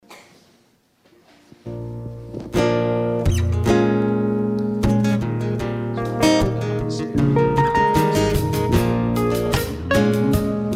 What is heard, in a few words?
house transitional song